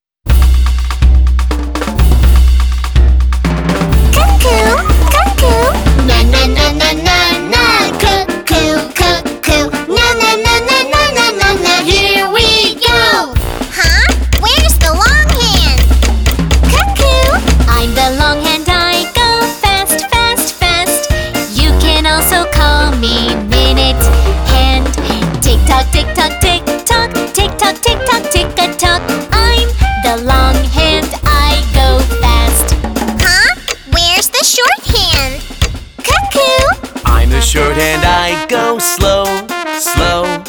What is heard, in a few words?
Clock Song